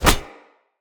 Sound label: Hoverboard Grab; Vehicles; Venus